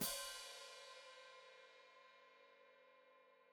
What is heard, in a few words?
Hat Closed Open, Hi-Hat